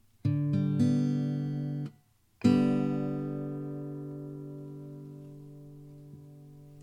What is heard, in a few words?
G open